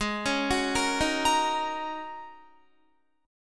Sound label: Ab 7th Suspended 2nd Suspended 4th down Guitar; arpeggiated